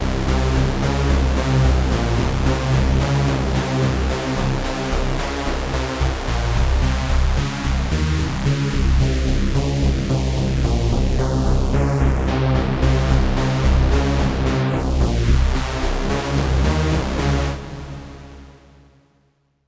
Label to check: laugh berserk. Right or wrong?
Wrong